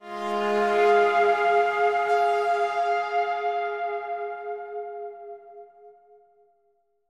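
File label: Synth F minor; Torchlight III; PS4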